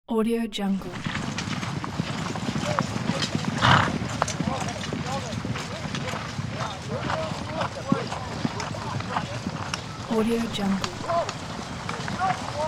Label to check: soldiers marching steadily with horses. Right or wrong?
Right